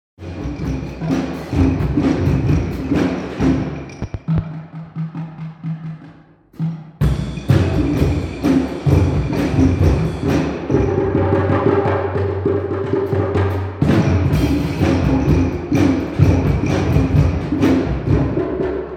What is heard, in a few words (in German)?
2 takt solo